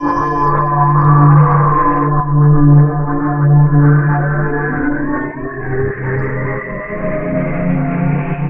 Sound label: FILTER GTR, GUITARS KIT; Big Beat Samples & More; AKAI